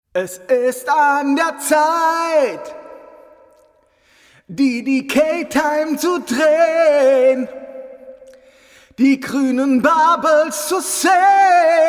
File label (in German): Vocals Reverb Plate 12 Prozent Wet; Ranaissance Reverb Plate2